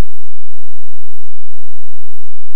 A#, Synclav FM; Big Fish Audio; SPACE1

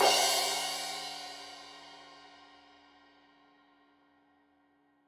Crash 15in L edge, Cymbals Stereo

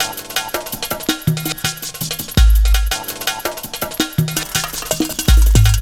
165 quiet break, Drum Loops, Drum & Bass; Total Drum&Bass

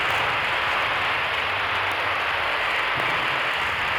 sparse soundscape samples; ReLooped

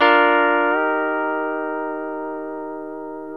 A# TO D#, Pedal Slides

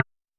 Perc Wood Low, Vermona Mono15 Drums

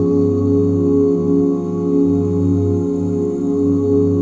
OOH G FLAT5; OOH CHORDS; ILIO; Vocal Planet; Jazz & FX

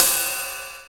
drm d#2, DRUMCOMPUTER, KORG DDD-1; Samples vol.55 - Retro Sampler

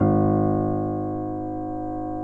PNO PP G#; Synclavier Sampler Library

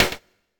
SD, dance drums hip

